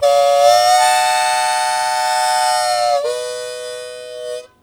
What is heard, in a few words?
Blues Chord Attack B1, Harmonica; Zero G - Harmonica